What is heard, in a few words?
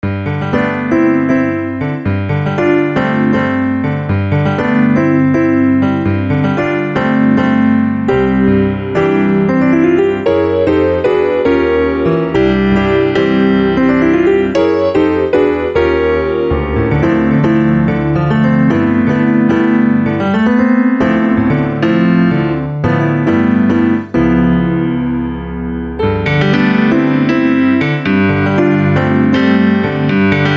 Congratulations!; America's Next Top Model